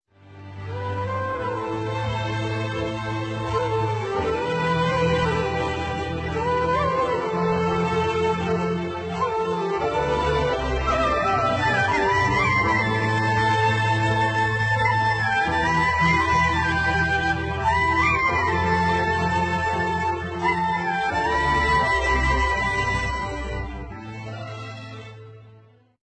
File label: Ringtone